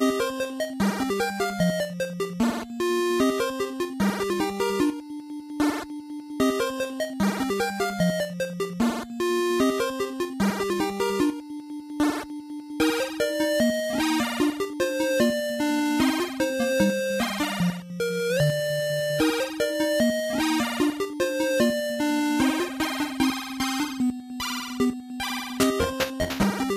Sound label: Music; save me mr. tako tasukete tako san switch windows gamerip 2018; World2 Level 2